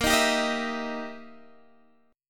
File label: E Minor Major 7th Flat 5th Bb